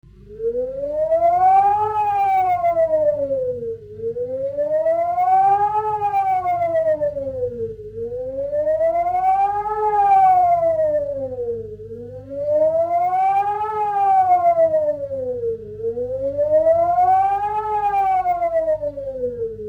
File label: PWS Alarm Signal